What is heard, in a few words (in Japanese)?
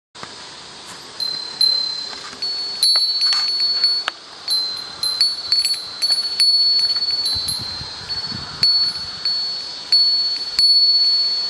furin